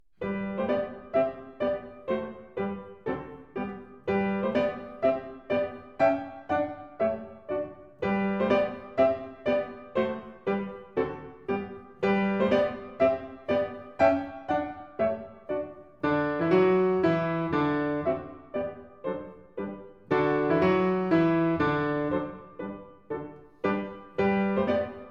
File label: cheerful march